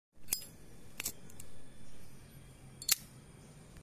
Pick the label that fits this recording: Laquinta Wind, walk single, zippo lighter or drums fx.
zippo lighter